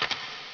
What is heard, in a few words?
item equip; metal gear solid